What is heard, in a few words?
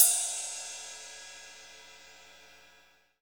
CYM Ride; Best Service Gigapack 2